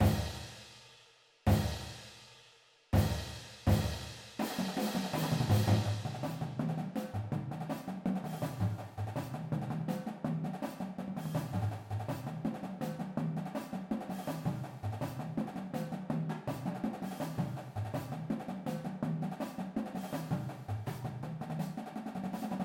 full drumline

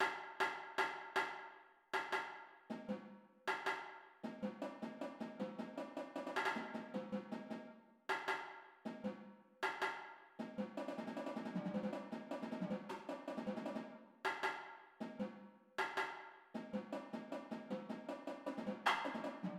tenors, drumline

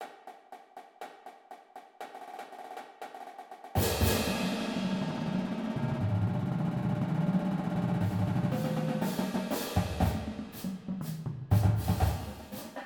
cadence full drumline

